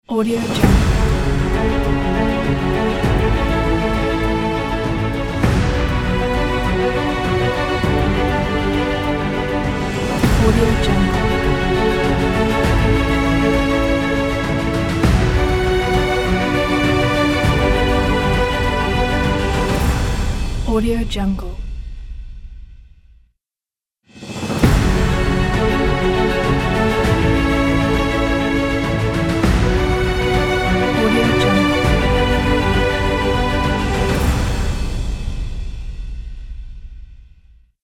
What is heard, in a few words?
ident logo